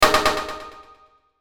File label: Hit High Delay; sound design; Blastwave